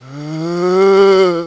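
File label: zombie; sinfar haks; sf effects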